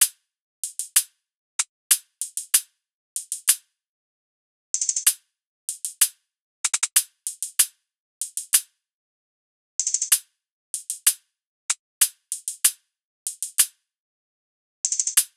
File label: loop hat 95; Seismic Loops